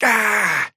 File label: pain small; Trapper